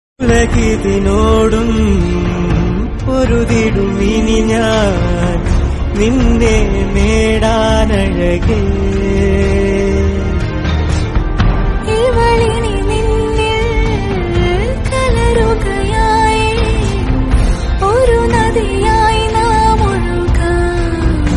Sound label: malayalam song